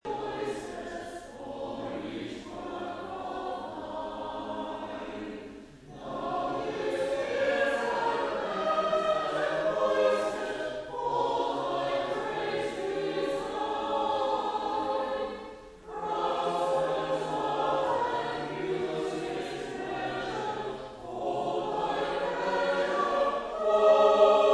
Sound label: visiting sonning choir